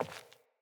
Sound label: Sandals Walk Default, footsteps; Player